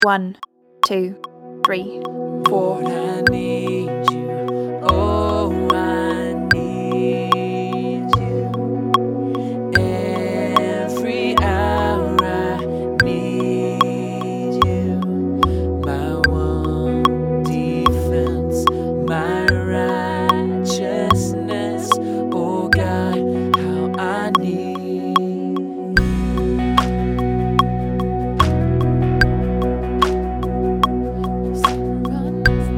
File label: acoustic track